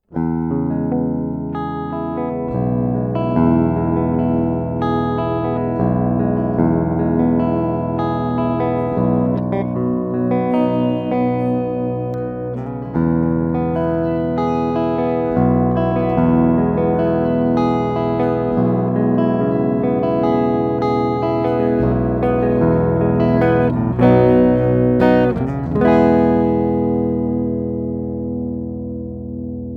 Direktes Signal aus der Gitarre

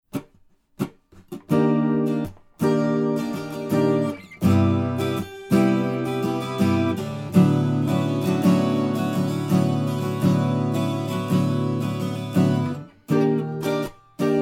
Taylor 12 string Chords Niere Mix Saturated; Lewitt LCT 1040

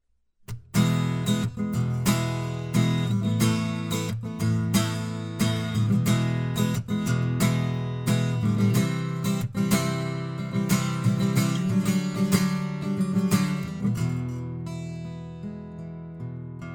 Akustik Gitarre; Oktava

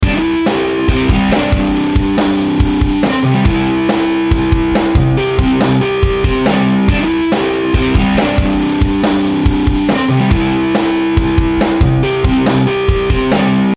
Rockriff Trash Jimmy Wah

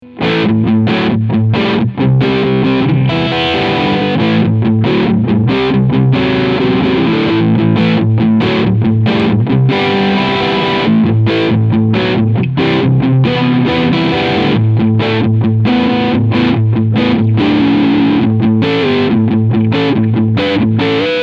Fender Vintera 70s Tele Deluxe Crunchsound beide PUs

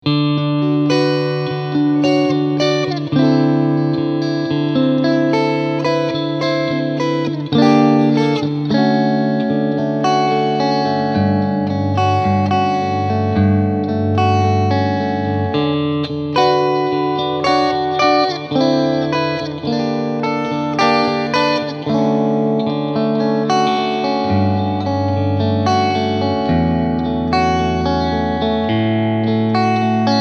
Clean EQ 3 Uhr

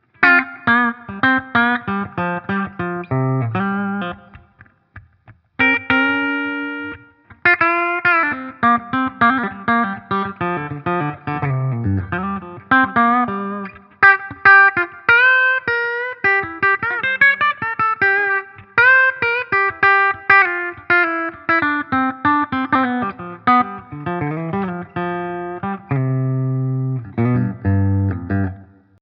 Hagstrom Alvar clean Steg

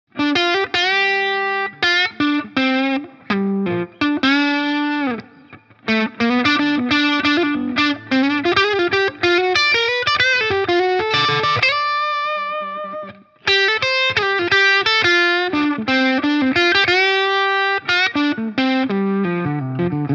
Fender Squier Toronado Halspickup verzerrt; Paranormal

